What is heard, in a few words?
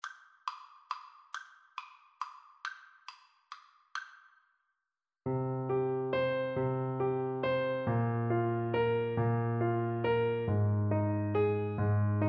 Oboe